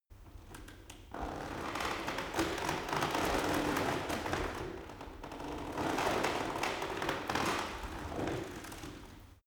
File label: cart medieval creak; kutsche; verkehr transport